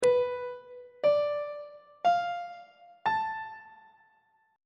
lydian 7th chord 4 is b half diminished 7th on treble clef